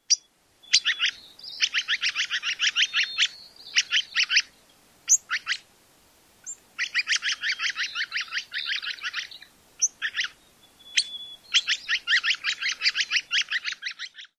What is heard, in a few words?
more calls